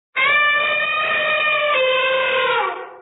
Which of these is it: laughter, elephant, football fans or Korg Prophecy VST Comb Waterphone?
elephant